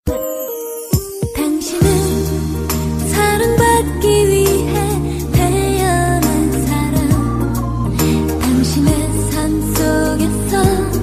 korean worship

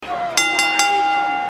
bell

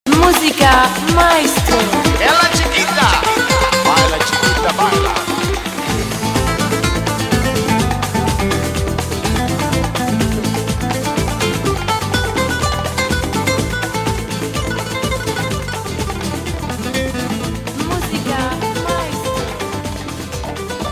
spanish guitar; ringtones; latino

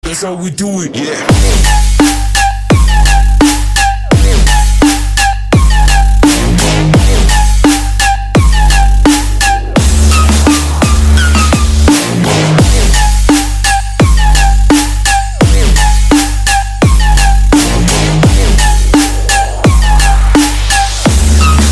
trap

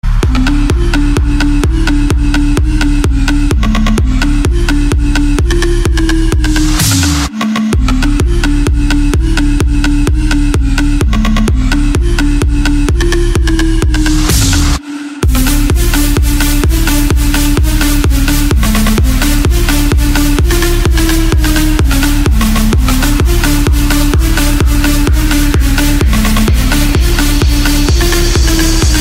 new electronica, ringtones

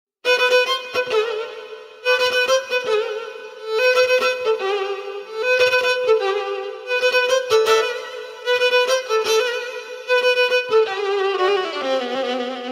violin blow a bag

